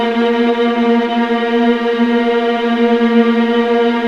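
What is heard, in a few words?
VLA A#1 TRM, viola tremolo, The Ultimate String Collection; East West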